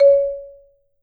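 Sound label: Marimba medium F C#4, marimba; Sampleheads - Dave Samuels Marimba & Vibes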